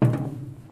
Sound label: footsteps metal Walk